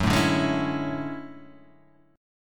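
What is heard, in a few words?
D Major Sharp 11th G flat, chord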